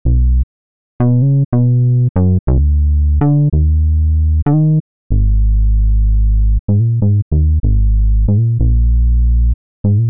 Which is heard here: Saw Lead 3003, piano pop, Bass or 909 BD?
Bass